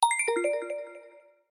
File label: Glittering; Infinix XOS 15